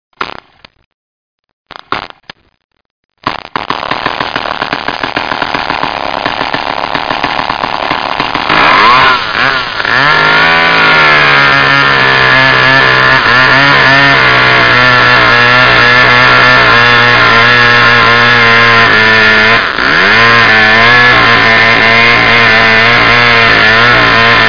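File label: chainsaw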